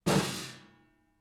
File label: Metal Footfall; soundscrate